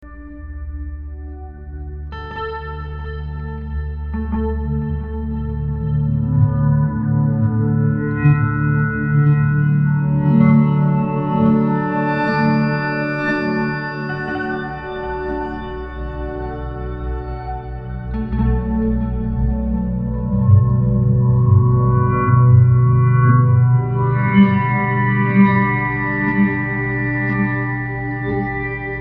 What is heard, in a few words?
Acoustic Guitar C